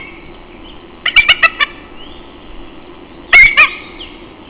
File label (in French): pygargue